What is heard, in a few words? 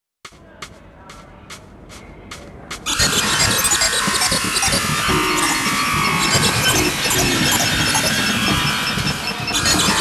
Electronica noise; bruitiste